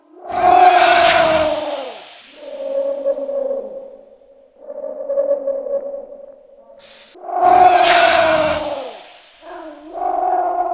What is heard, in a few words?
yeti